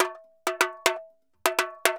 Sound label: TAMBORIN; Best Service ProSamples vol.14; AKAI